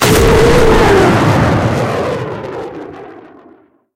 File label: 158mm shot, cannons tanks; War Thunder